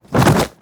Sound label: Fight Die; Mighty Party; Android